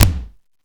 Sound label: bass, AKAI XE-8; Greytsounds